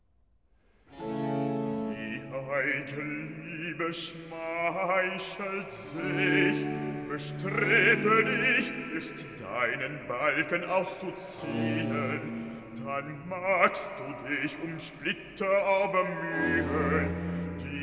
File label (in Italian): Cantata, Recitativo; Bach; Tewkesbury; 4th Sun After Trinity; BWV 185 'Barhmherziges Herze der ewigen Liebe'